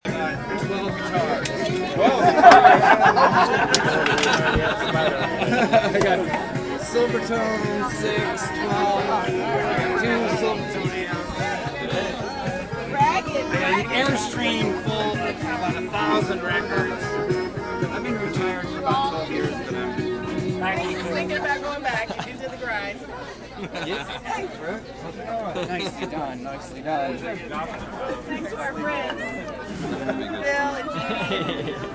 crawfish boil; rook neely s

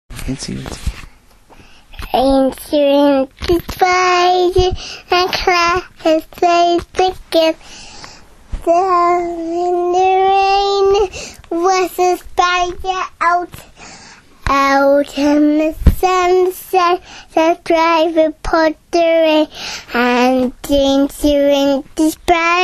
singing incy wincy spider

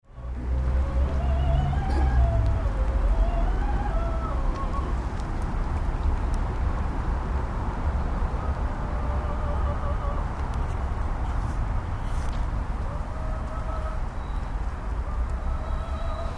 singing outside perm opera house russia

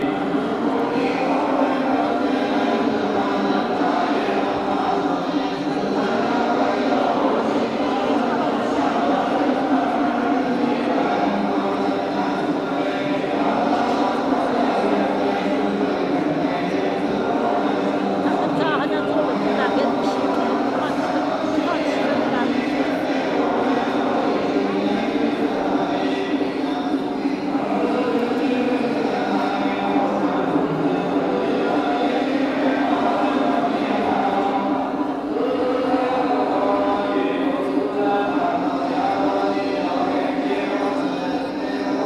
buddhist monks chanting at ling yin temple; hangzhou

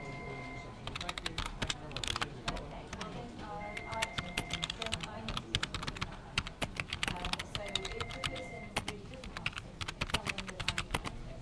typing up a case study

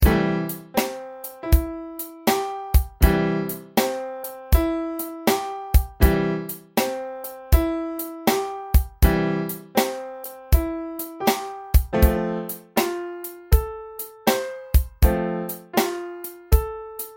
blues track

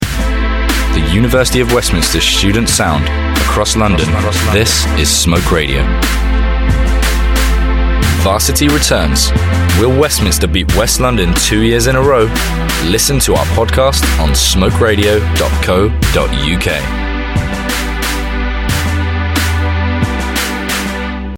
varsity jingle; smoke radio

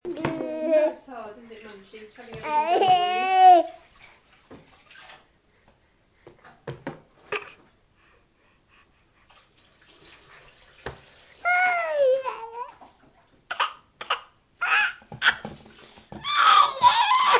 mealtime

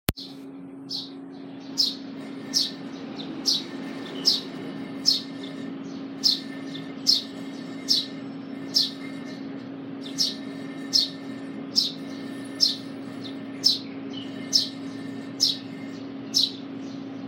bird

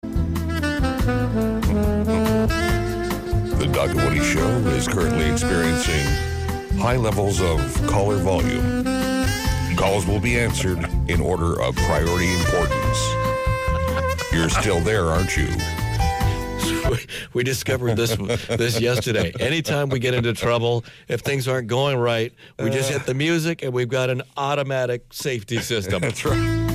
interlude music

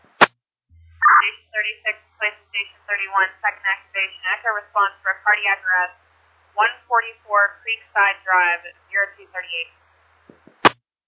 page

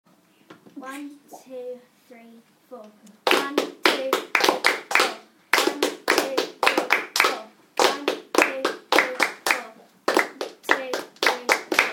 rhythm polished

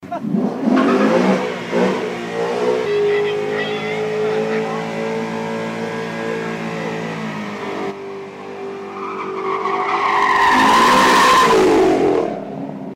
boy racer sound effects

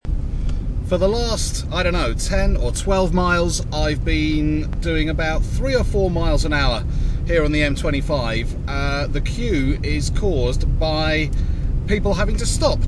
M25 queue

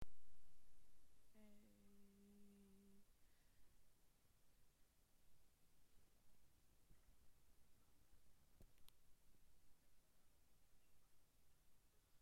music

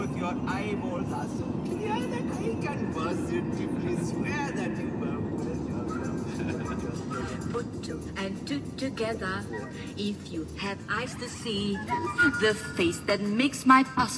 car music

